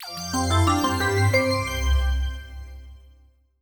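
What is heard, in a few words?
power on; braillesense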